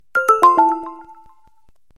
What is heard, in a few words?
Notify Windows